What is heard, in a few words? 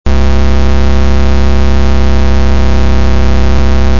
buzzz